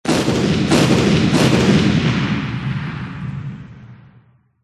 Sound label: battery; c-evo